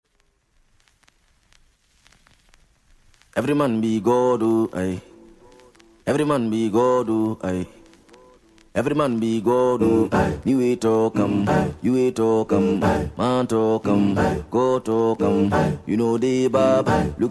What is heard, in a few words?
Chant